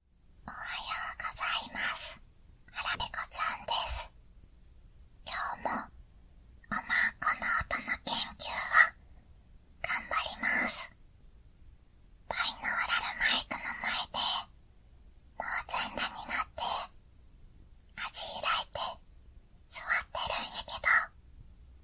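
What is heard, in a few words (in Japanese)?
クリ吸引付きバイブ！Gスポットバイブ！でオマ●コの音の研究; テ●ガエッグ！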